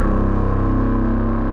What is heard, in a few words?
Nasal Beast Bass; Instruments; Wavetable; Ableton; Synth Essentials